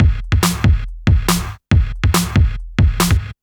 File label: electro beats 140